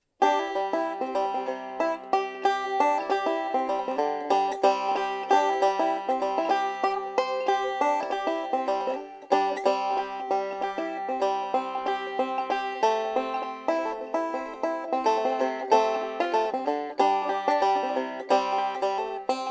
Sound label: pick